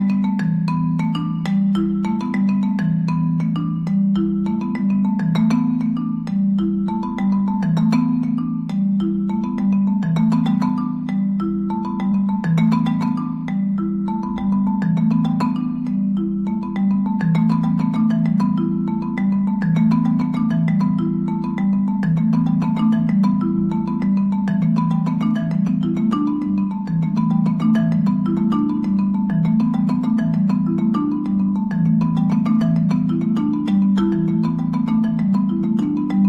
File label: Percussion, Marimbas